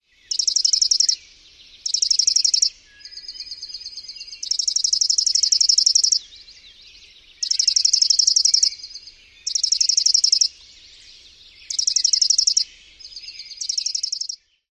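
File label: rapid-fire dawn song, Chipping Sparrow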